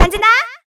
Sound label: throw; Heaven Studio Plus; pajama Party